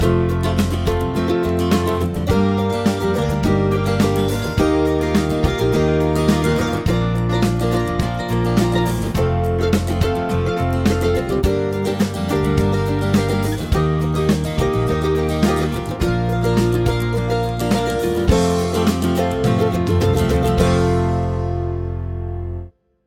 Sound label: chord prog with mixture; harmony; root